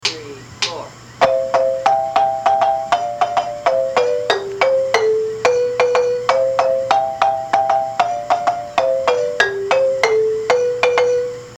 intro line, marimba; manhanga

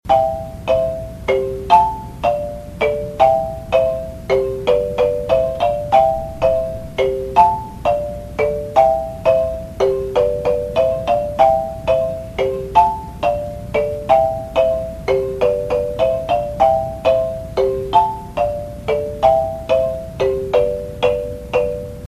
lead chord idea, marimba

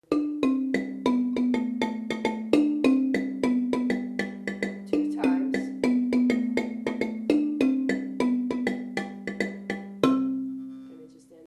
ending, marimba; low line

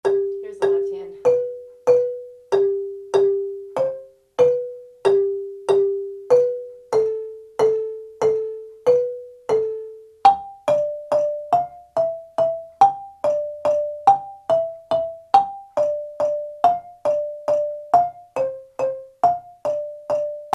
lead muchongoyo separate, marimba; kare mugomba

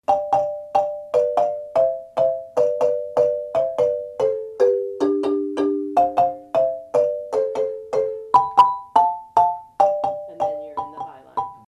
lead descending to high, marimba; kare mugomba

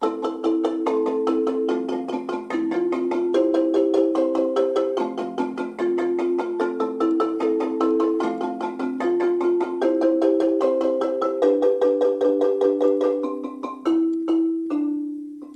t1 and t2 b part, marimba

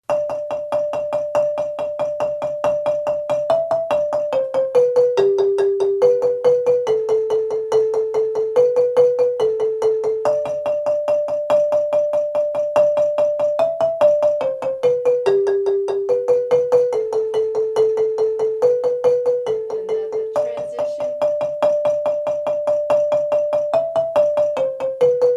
lead response rolling, marimba; kare mugomba

